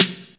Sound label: MIX SD, DRUM RYTHM; AKAI S6000 CD-ROM - Volume 1